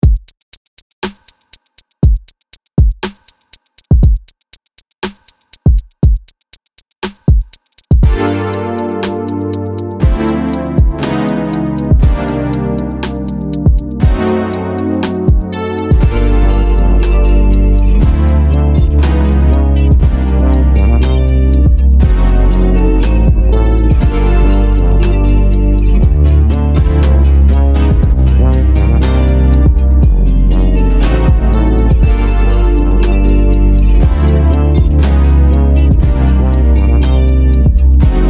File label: oley is so good at guitar